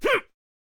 Attack Vocals

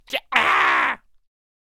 Quick Draw Death Vocals

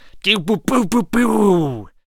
Gunslinger Attack Vocals

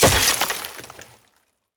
Ice Block Break

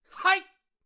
Taekwondo Attack Vocals

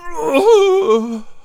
Healer Attack Vocals

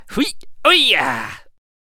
Ball and Chain Attack Vocals

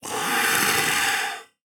Skeleton Warrior Attack Vocals

